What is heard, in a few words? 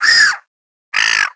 seagull; ambient birds; World of Tanks Blitz